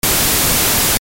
noise white, synthesizer basics

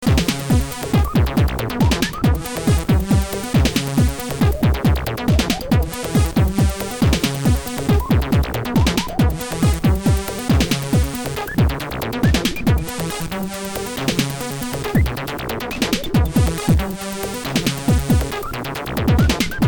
beats filter FM

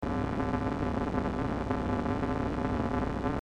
ems SELF mod Spectrum

